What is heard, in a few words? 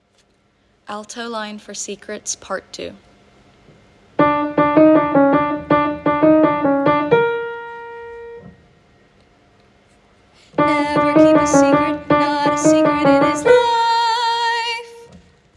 Alto